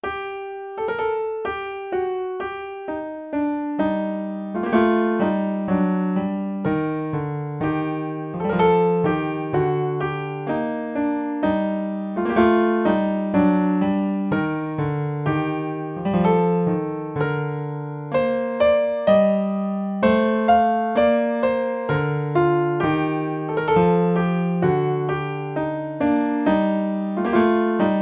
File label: Canon in G minor, piano